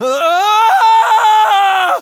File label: fulton scream; mgsv